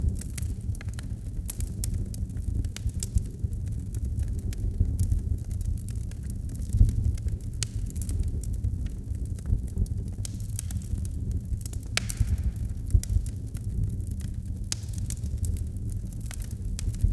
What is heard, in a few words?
cozy fireplace crackling; wooden creak